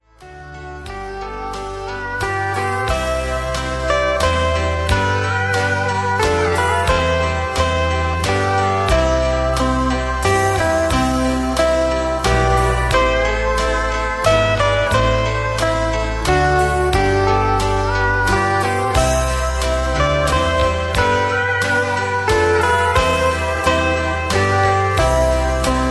High Key with Leads

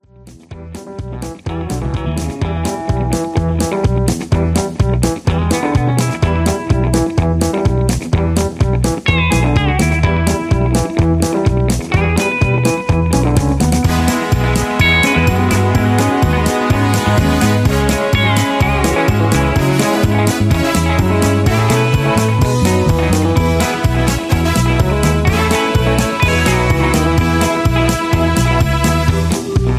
Instrumental with Leads

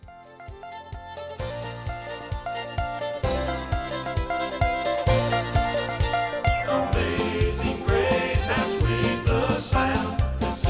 Instrumental

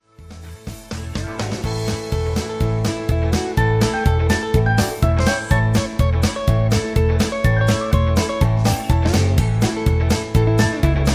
Hoedown Instrumental

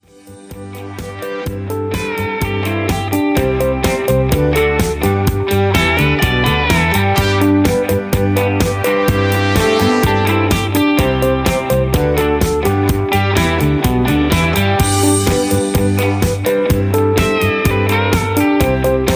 Instrumental Leads

